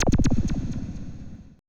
AMBI FX

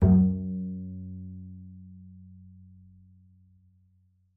F#2, bass pizz; Xsample VOL-5

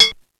GLASS, ELEC PRCS; East West; Global Sounds

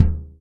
JAZZ K, Ensoniq ZR-76, Drum Machines

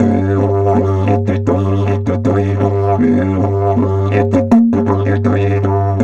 Didge 80 BPM, Didgeridoo

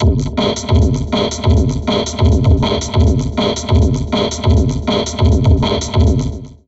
Drum Loops Crazy Processed

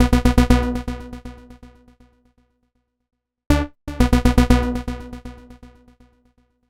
Progressive Synth, Trance

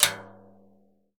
CASCARA, Synclavier Percussion

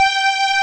ELEC GRAND; OMI - Universe of Sounds

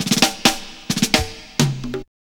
Rock n Roll, Drum Rolls; Zero-G Jungle Warfare 1, 2 & 3

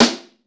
snare, Roland

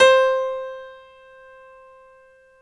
9FT GRAND; OMI - Universe of Sounds

